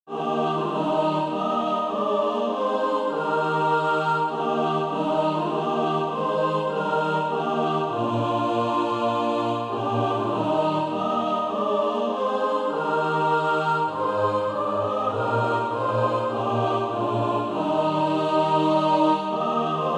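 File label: mixed, Practise Music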